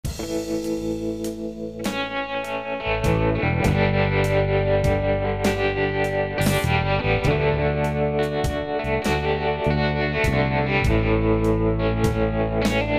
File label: Guitar